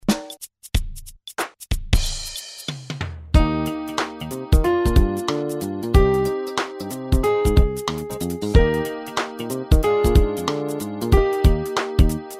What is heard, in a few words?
Five Note Scales [F]